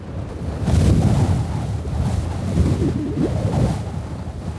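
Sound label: whirlwind; EnvSound; whirwind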